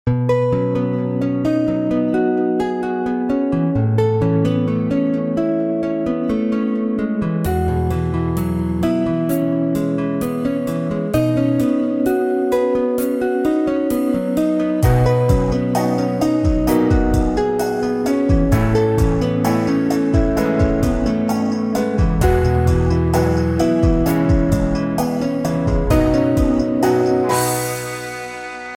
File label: Non Stress Tone